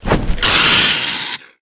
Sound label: Enter Play